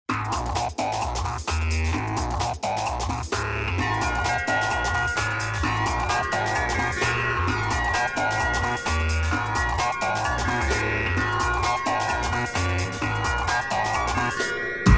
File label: Pipa MC Guitar